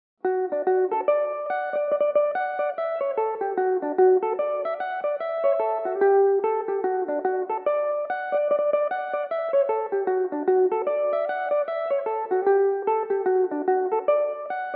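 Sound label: D major, irish mandolin, tunes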